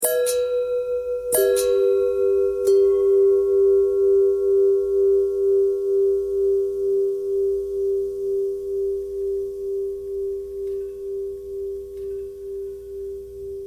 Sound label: long bell long bell thread pentatonic, bells